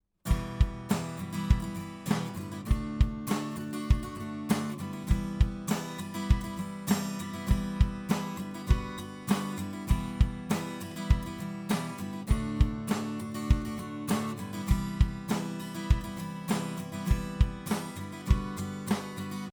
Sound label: AKG C 451B neck stroke